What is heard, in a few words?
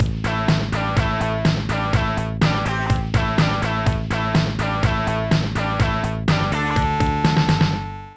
riffy; Sonix Music Driver